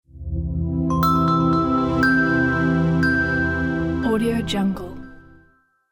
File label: elegant logo